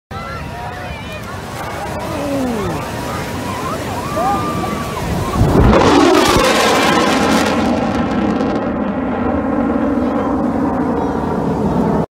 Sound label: F-35 sound